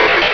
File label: cry mudkip; pokefirered; direct sound samples